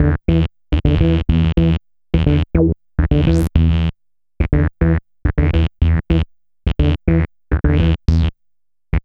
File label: E-Bass + Analog Synth